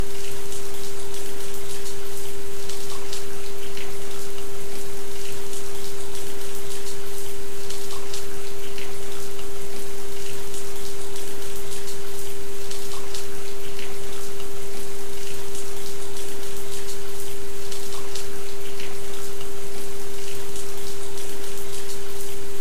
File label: Transmutation of fear with rain sounds